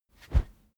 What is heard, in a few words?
whoosh wood cornered slow; swooshes-swishes